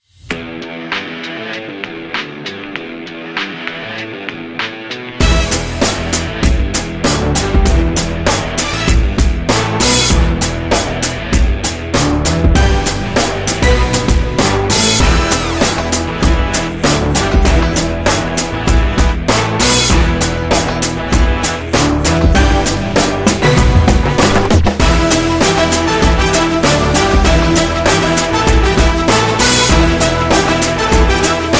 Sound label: Upbeat Hip Hop